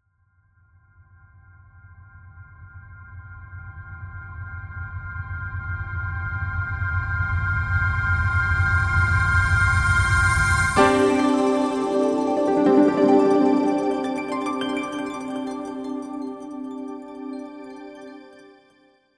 Inspirational, Musical Beat Soft Rock Composition; Miscellaneous Musical Sound Effects; Blastwave

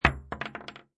Bullet Shell Drop Machine Gun Hollow Wood; Machine gun empty shells fall on hollow wood; Impact Sound Effects

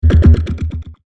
Percussive Firepower, laser gun; Royalty free science fiction sound effect - excellent sfx for star wars / trek style games; Andrew Fleming

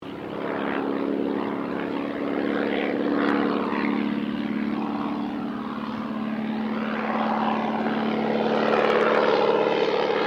AIRCRAFT DH82A DEHAVILAND TIGERMOTH PASS; 1936 Tigermoth; Airplane Sound Effects; Vehicles

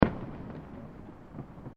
Fireworks Single Firecracker; Backgrounds; Crafted Signals

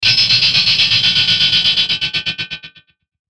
static chopper; Static Chopper Filter Swell; Production Element Imaging Element Accent Transition; Blastwave

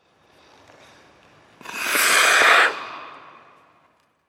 Ice Skate Approach; Ice Skate Approach Slow Stop Snow Plow; Snow (Sound Effects:Weather)

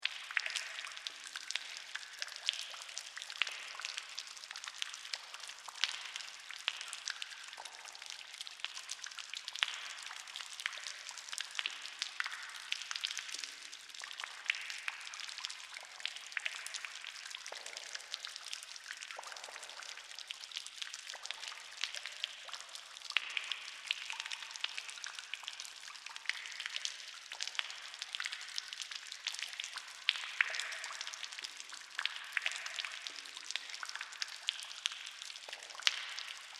Ambience, Cave, Water, Drips, Loop; 5.1

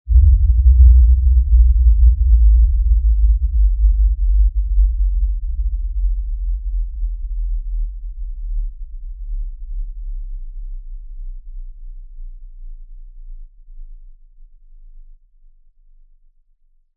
Impact Lfe Tremor Slow Fade Descend In Pitch; Stutters; Blastwave

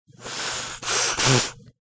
Nose Blow, Quick dry nose blow into tissue; Sick; Human

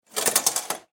Household Shower Curtain Close, bathroom

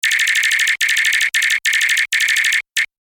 Beeps Data Transmission Technology

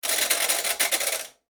Household Washing Machine Dial Turn Short; Cleaning

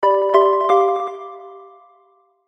Musical Soft, Musical Soft Synth Chords; Musical Soft Synth Delay Chords; Musical Sound Design; Blastwave

